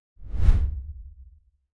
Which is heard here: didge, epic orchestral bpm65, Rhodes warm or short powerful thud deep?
short powerful thud deep